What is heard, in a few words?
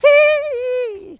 hee, laugh; ooh ahh